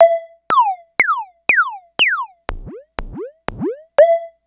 Vermona DRM1-MK2 multi

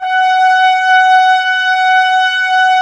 BRS, Woodwind Brass Ensemble; Miroslav Vitous; Brass Finale

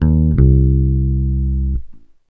TOUCH 86 C, Bass Grooves; USB Soundscan vol.30; AKAI

